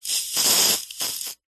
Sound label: household aerosol deodorant spray x1 weak wet